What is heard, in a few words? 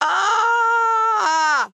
Scream